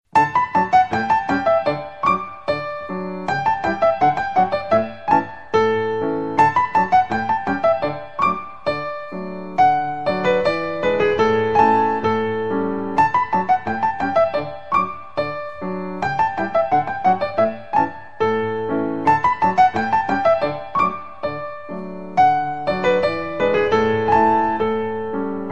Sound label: piano